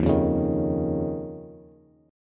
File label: Eb 7th Suspended 2nd Eb, Bass; Eb7sus2 strummed; JGuitar